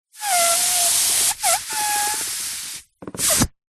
balloon air release deflate from valve hiss squeak; foley